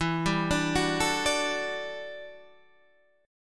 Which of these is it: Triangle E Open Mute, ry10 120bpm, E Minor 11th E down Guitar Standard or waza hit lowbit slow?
E Minor 11th E down Guitar Standard